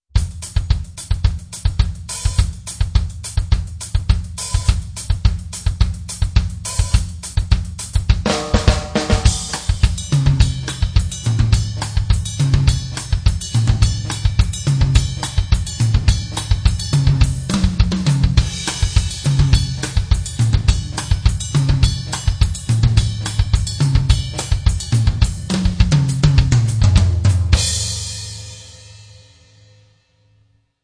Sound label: salsa, drummer